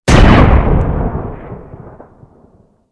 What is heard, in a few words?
beam sniper, weapons